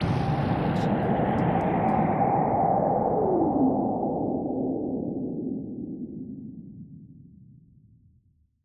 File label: Anime Super Blast Off